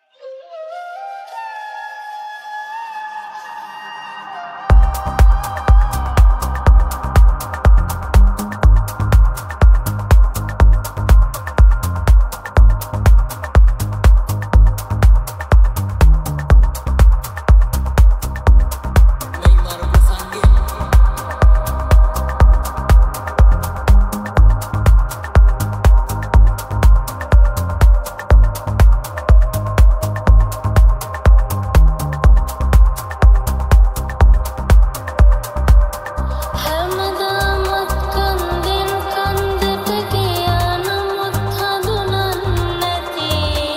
organic progressive house

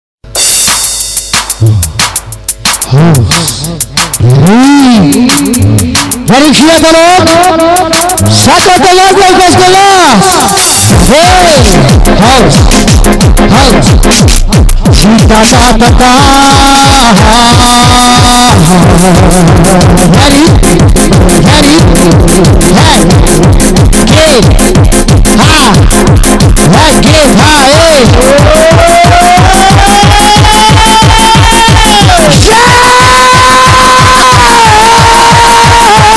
house bass boosted extreme remix

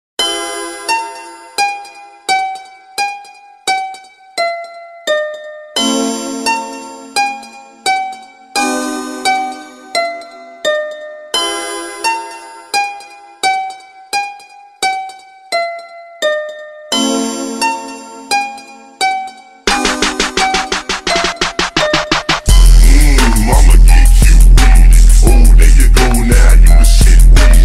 bass boosted songs

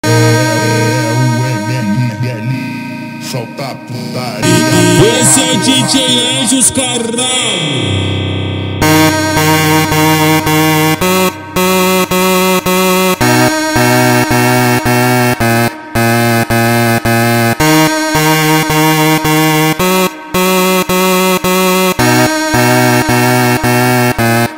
instrumental version slowed

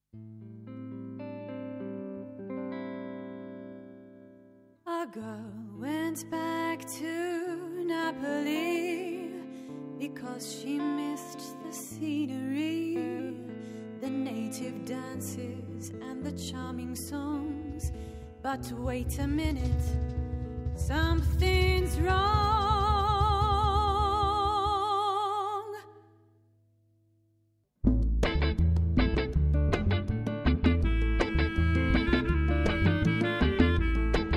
mambo

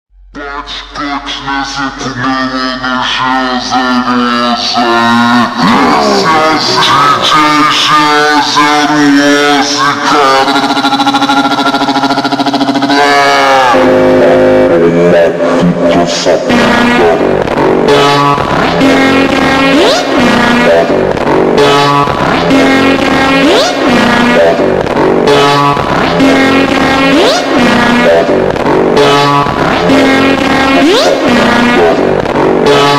super slowed reverb

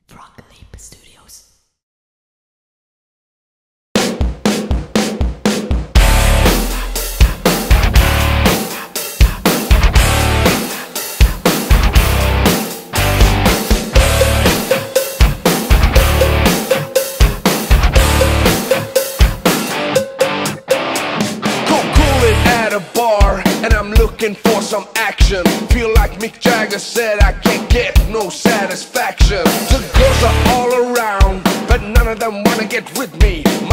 metal cover

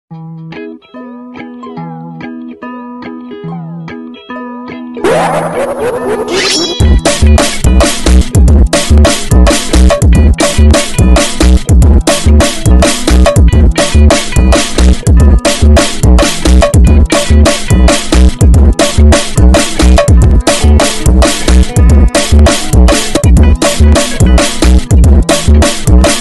automotivo funk; SPONGEBOB FUNK

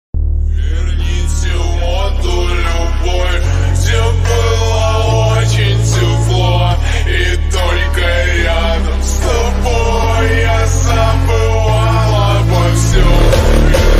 slowed hardstyle

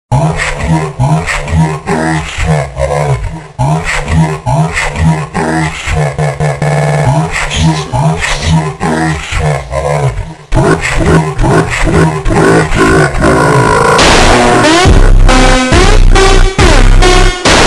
funk mega slowed version

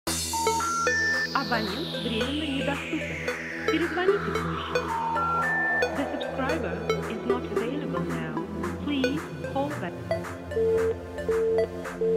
edm

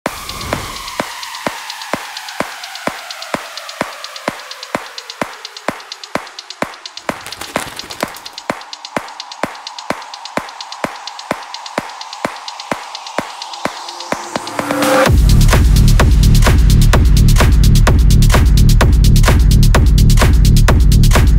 big room techno

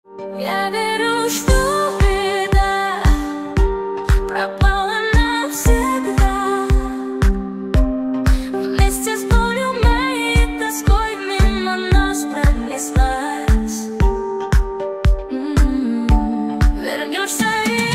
dance music

